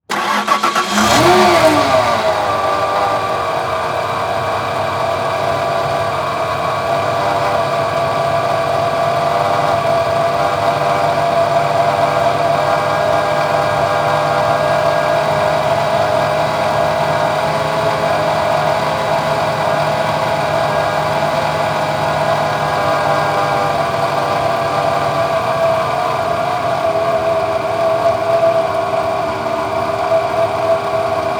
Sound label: Truck Ford Ranger Start Idle Off Mic In Front; transportation